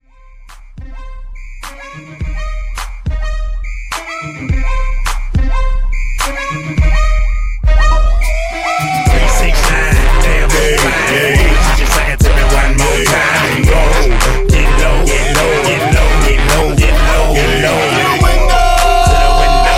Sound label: Bumba Bass Quick Transition 105 128